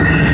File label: door